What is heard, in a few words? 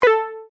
UI Deny, User interface feedback sounds; Ui Set J; Computers; Electronics